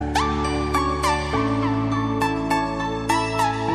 instrumental notification

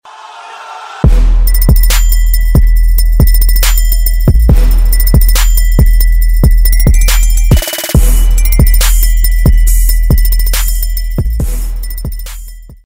time to trap

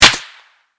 whine

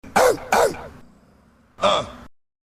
bark barkk

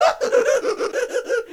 lolbit lauhg

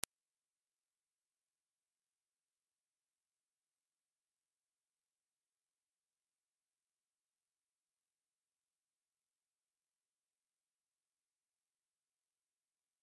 16000 Hz 16 kHz Sine Wave Sound Frequency Tone; beeper

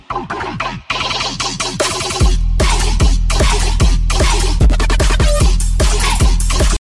YEAR 7 CHAV MUSIC

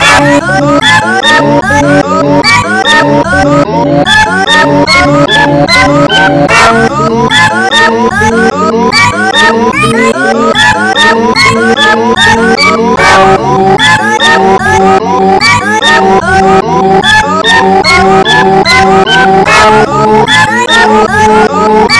steel drum

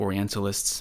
oriental

